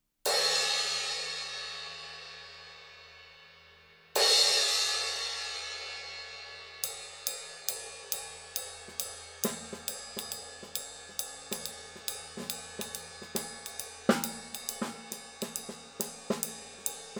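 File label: paiste 602, cymbal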